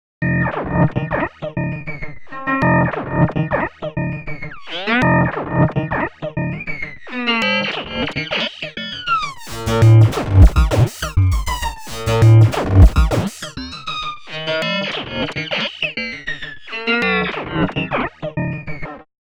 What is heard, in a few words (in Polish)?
rytm industrial, Pady; Muzyka Ilustracyjna